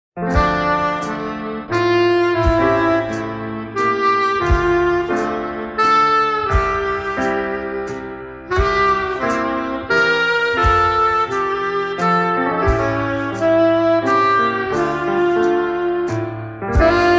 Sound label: Piano, Saxophone & Drums